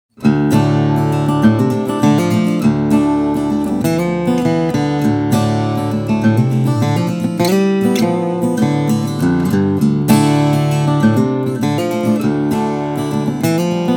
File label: neck mic body mic